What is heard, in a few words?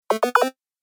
Retro Gaming Sound; Video Game Sound Effects